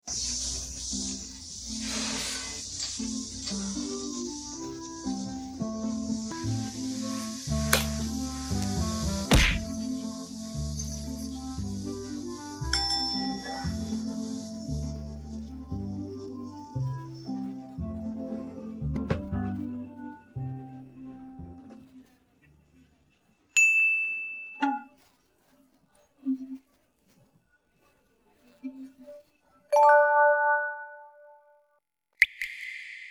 no vocals

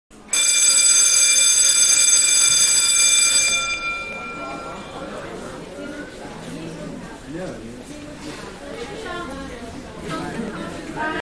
bell 2nd floor

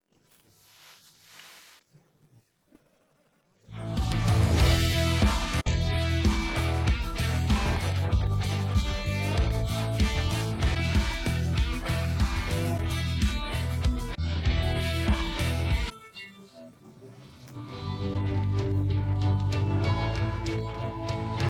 no vocals

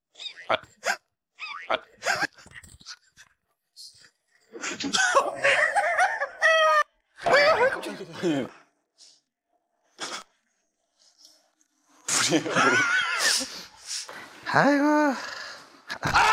vocals

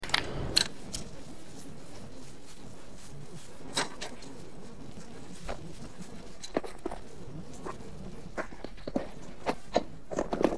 no vocals

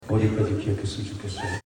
phone vocals

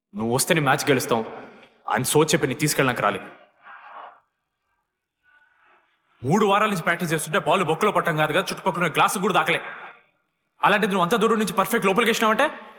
sad vocals

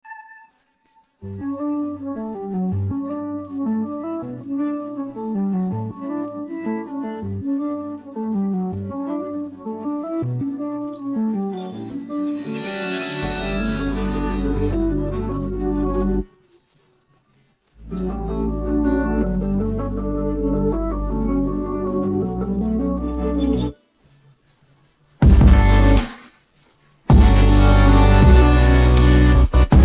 no vocals

